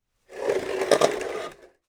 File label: creaky wooden scrap